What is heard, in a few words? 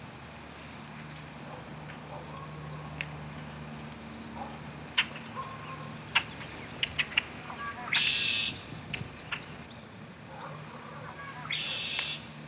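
forest ambience; dod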